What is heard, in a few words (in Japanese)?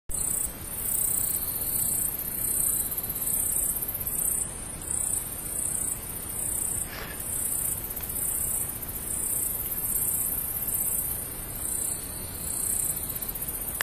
mushi no koe; 鳴き声